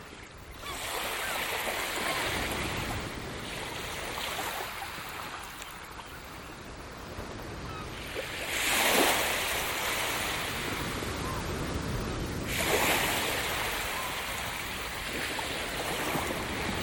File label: Morning seaside